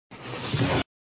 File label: Sound Ball lock sound effect, flipper; Ball lock sound effect v1